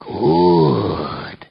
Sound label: LAUGH; BattleSNDS